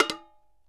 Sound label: can hit; AKAI S-Series CD-ROM Sound Library VOL-6